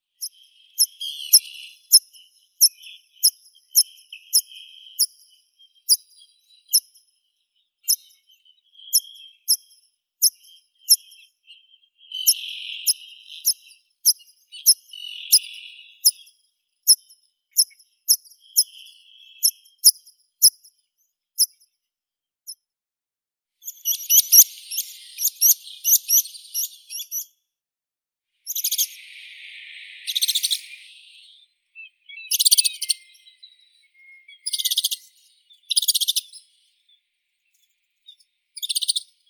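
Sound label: rock pipit, Anthus petrosus; konek skalnii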